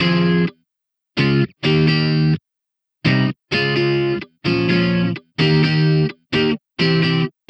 Electric Pop Guitar 128BPM Fmin; Vengeance - Essential Clubsounds Vol.4

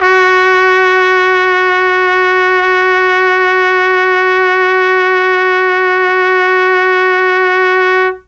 F#2 trumpet, Mellotron; CHMB TRUMPET; Mike Pinder - Mellotron Archive CD

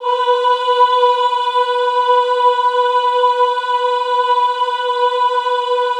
AHH B3, Classical Choir; Best Service; Extended Classical Choir; AHH MIX

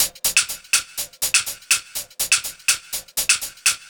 123 Minimal Funk Hats, Drum Loops; 123bpm; Nu Dance Electro

